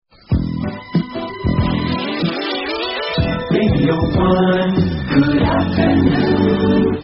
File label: radio 1 jingles good afternoon